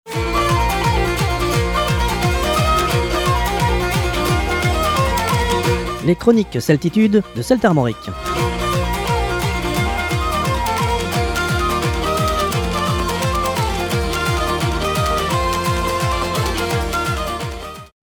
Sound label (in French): jingle; celtitude celtarmoric chroniques